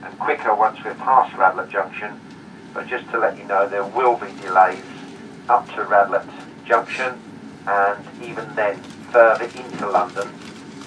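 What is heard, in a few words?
slow train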